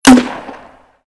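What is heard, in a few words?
grenade launcher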